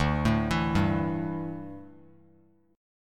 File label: D Suspended 2nd Suspended 4th D down Bass Standard; arpeggiated